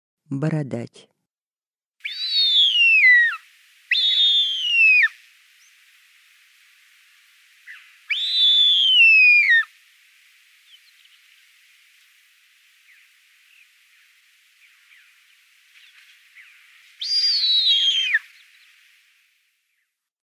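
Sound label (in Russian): borodach golos, bearded vulture voice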